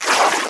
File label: greatest general attack